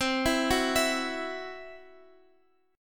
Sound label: C-Major Flat 5th, Guitar Open F; arpeggiated